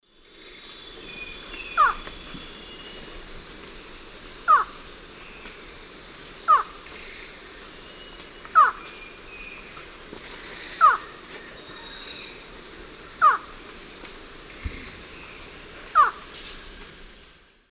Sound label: Thamnophilus aethiops, calls; S. America; Eastern Lowlands